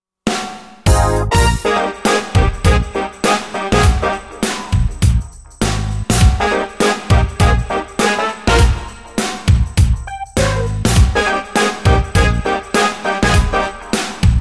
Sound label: brass minus